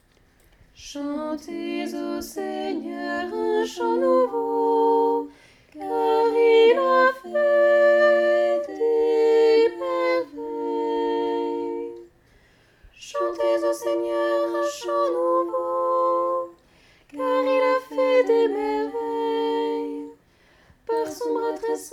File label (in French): Psaume 3 voix; psaume 97; trois voix égales; Soprano; Alto